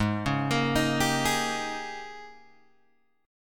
G sharp Major 7th Suspended 2nd Suspended 4th Guitar Open G